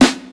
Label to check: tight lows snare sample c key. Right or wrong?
Right